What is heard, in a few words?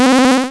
game start